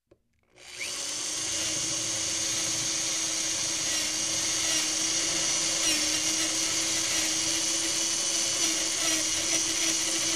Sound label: Power Drilling a Concrete